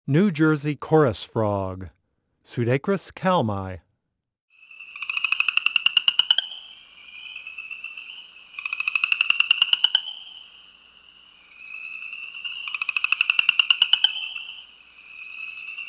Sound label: New Jersey chorus frog; amphibians; frogs and toads; frog calls